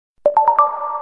sms

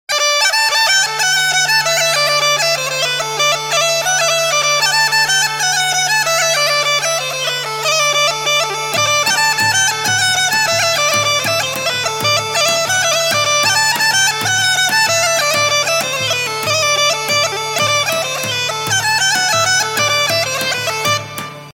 bulgarian bagpipe

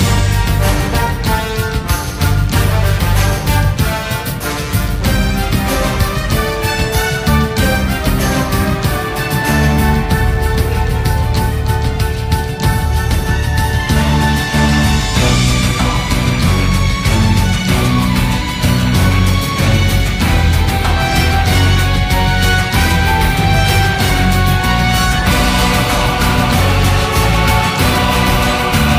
world of tanks music

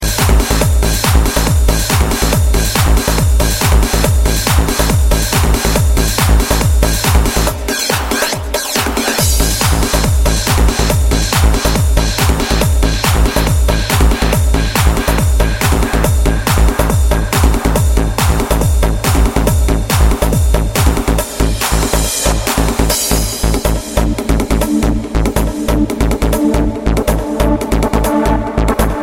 techno

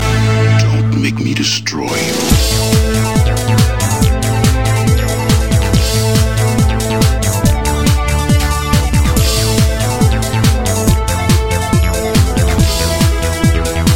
electro mix; star wars

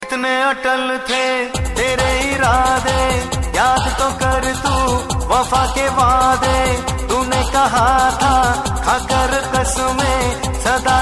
hindi romantic

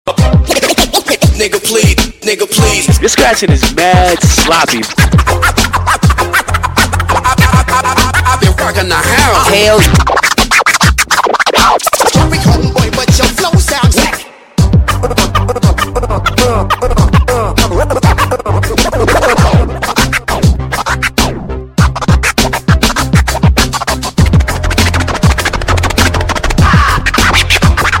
hip hop scratch

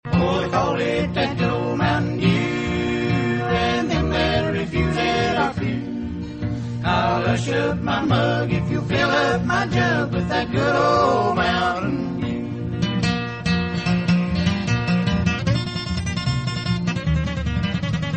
mountain dew country

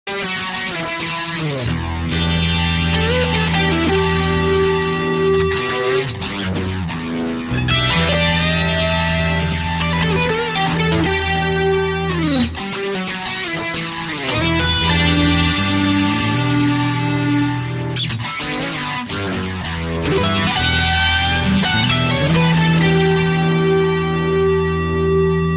flange

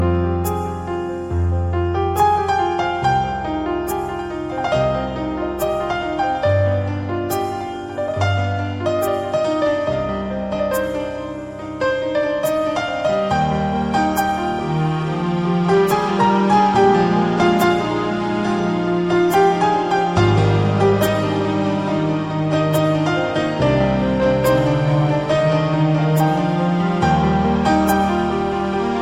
piano music

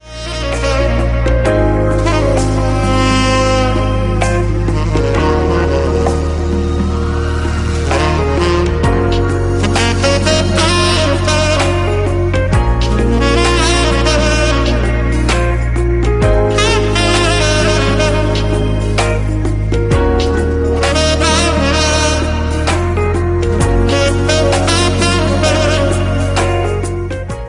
best romance sound

